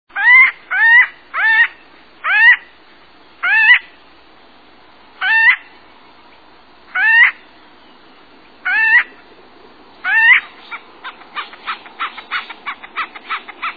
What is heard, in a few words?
Acorn Woodpecker